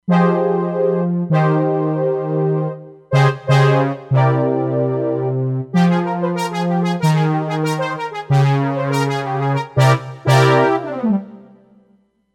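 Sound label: Silk Brass Pad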